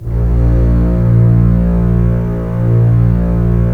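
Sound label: Strings contrabasses; Roland - LA Composer vol. 4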